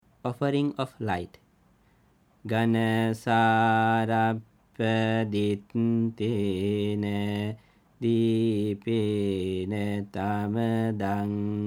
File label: offering of light, Paritta Chanting